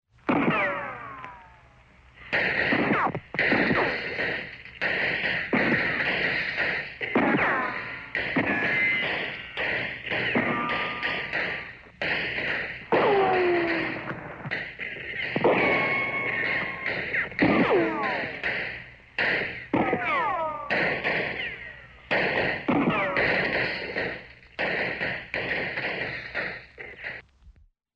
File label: Western Gun Fight; SOUND EFFECTS1